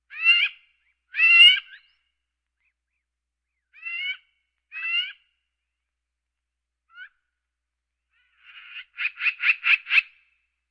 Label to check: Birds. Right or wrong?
Right